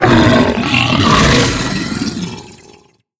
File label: death, barnacle creatures; Half Life Alyx